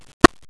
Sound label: fart